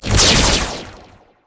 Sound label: laserring medium